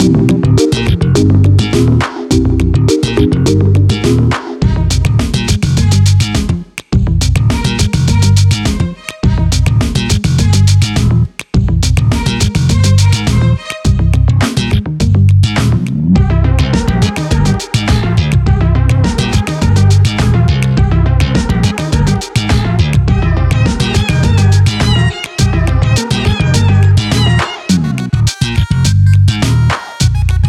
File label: RESULTS SCREEN; SLAP CAT